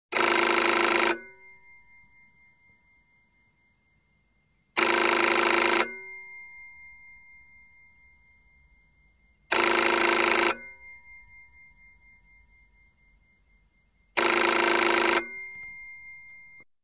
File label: Telephone, European Ringing, Foreign; Sound Ideas; Series 2000